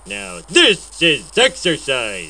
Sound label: pain; quake2; homersimpson